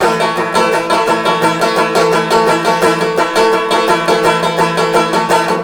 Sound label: banjo; zombat; danger